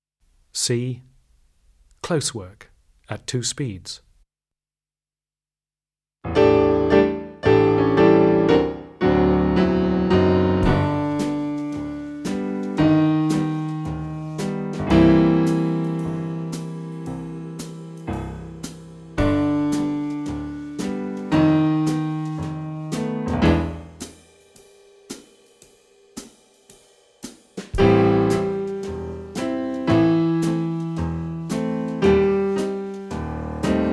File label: Close Work at two speeds